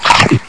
BITE; 7invasores

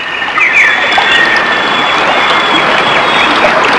birds by river; Nature

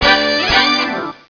SAX

